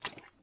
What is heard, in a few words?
fs mud, footsteps